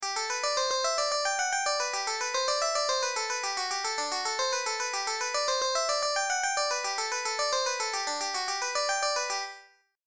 Solo

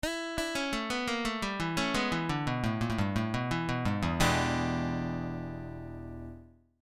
Lick, Blues lick

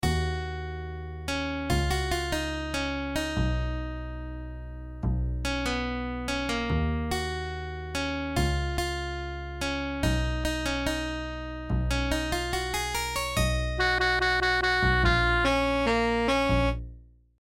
solo

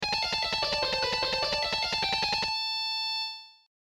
Pedal Pointing

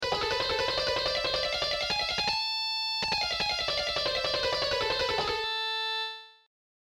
Slide Pattern

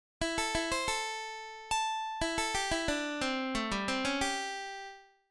Lick, Blues lick

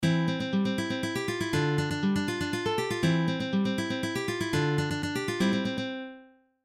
Irish jig

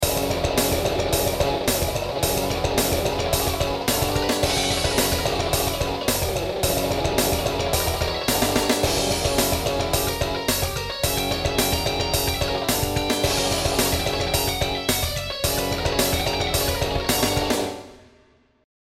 Heavy Solo; Shredding Exercises

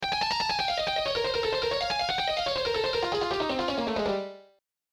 Shred; Plan Shred N°1

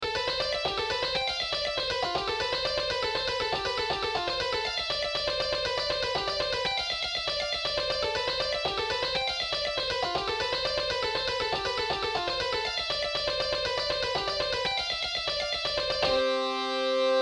Picking in Am

